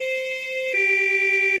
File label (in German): auto sirene; minecraft